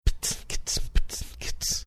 whispering boots and cats boots and cats without vowels